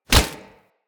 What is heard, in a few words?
Unarmed Punch Impact Flesh Armour Heavy; Weapon Impacts; Player; Combat